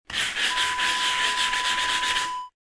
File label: scrubbing surface